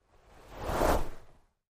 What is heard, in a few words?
swish